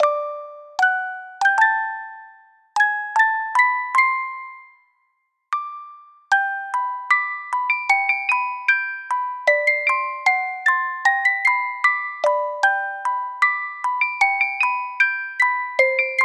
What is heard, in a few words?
Parade (Music Box); animal crossing new leaf welcome aamiibo soundtrack